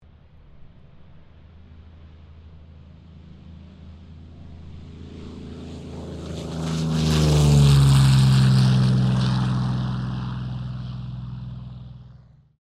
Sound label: AIRCRAFT P-40 KITTYHAWK TAKEOFF; Airplanes; Vehicles